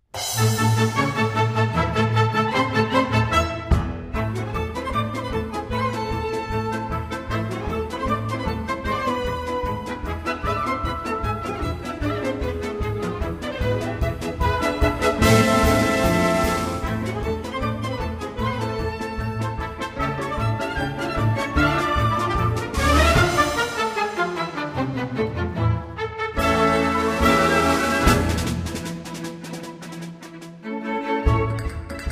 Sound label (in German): Spanischer Marsch